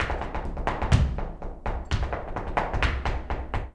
Flamenco, Tapping, Zapateado Solo; ProSamples vol.46 - Flamenco

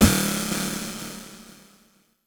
Doppler; Zero-G; Soundclash Nu Dance Electro; Acidized Wavs; FX Atmospheres

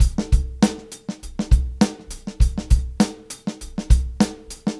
Live Beats, R&B Drums

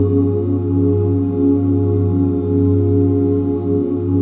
OOH G FLAT5, OOH CHORDS, Vocal, Jazz; ILIO; Vocal Planet; Jazz & FX

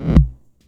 Tom Reverse, TR-606 Bent Samples; Sonic Foundry (Sony Creative Software); The Electronic Music Manuscript Collection; Battery 3 Sample Kits

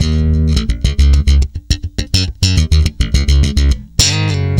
D#, tweaky, bass; Spectrasonics; Bass Legends

